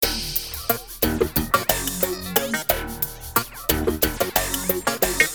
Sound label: depressing rock music